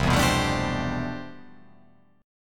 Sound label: C Major 13th C